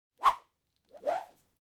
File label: whistling whip